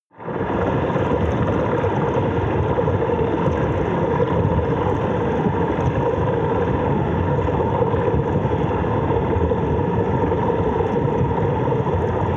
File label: water fx dark